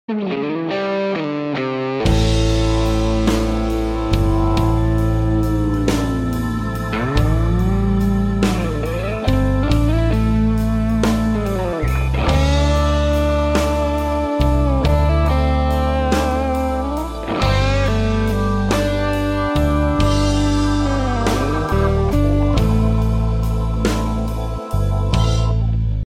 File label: intro guitar, ringtone